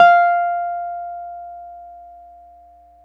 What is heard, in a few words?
WURLY F4, WURLITZER; Big Fish Audio; First Call Vintage Keyboards